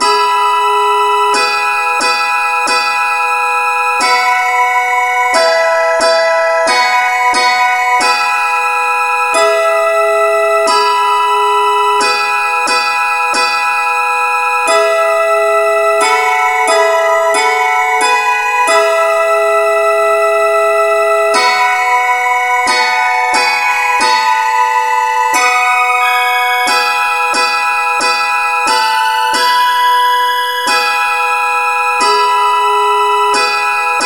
no vocal key e

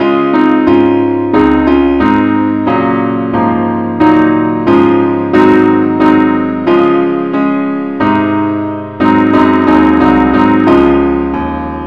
keyboard only key cis; glory be to god on high; gwalchmai

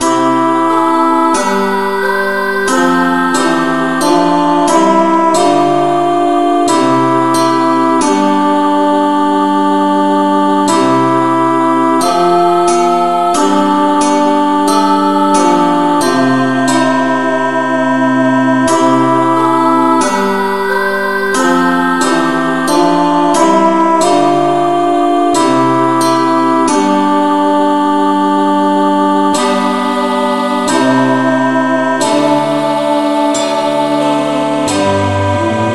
no vocal, key C; Gwalchmai; Glory be to God on high

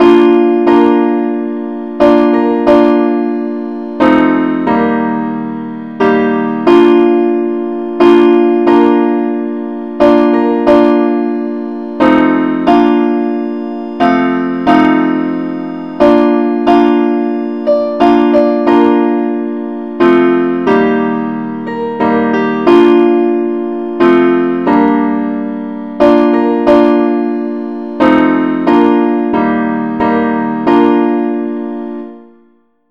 simple acc key bes

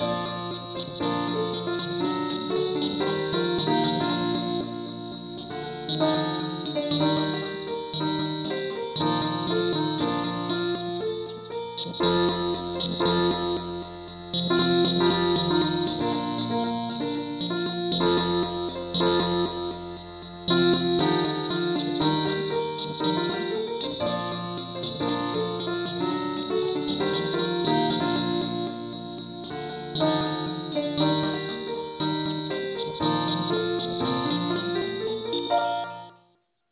keyboard only

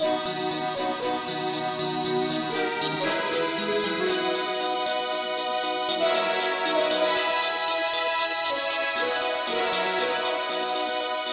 mandolin Lead key b

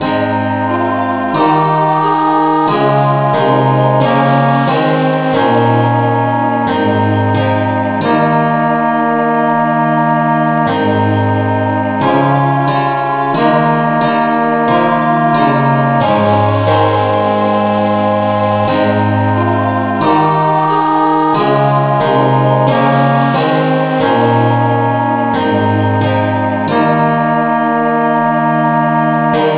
revnovocal key aes; Gwalchmai; Glory be to God on high

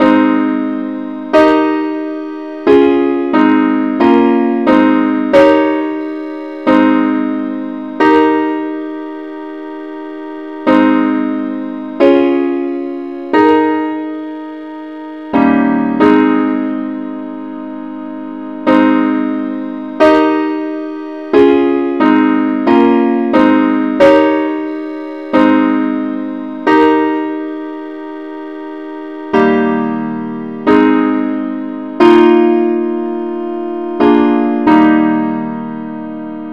simple Acc key aes

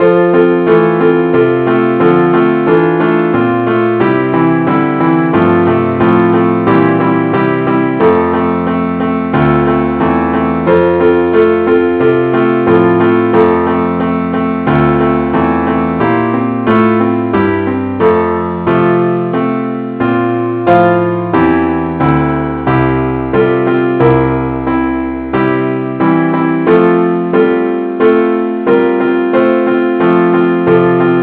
keyboard only key f

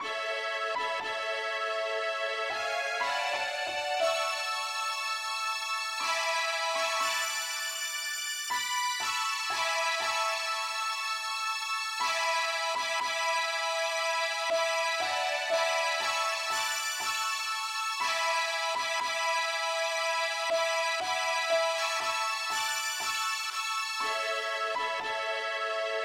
uke lead key a

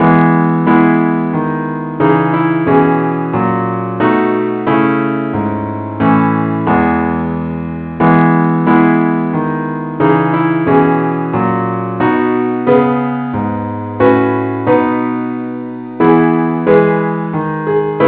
parts only key e

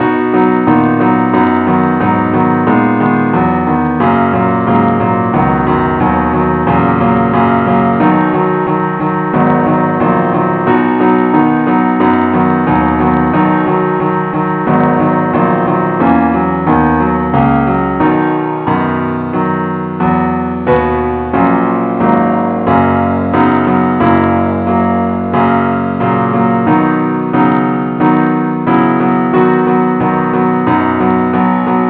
keyboard only key b

